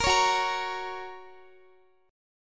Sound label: Gb Major Gb Guitar; Gb strummed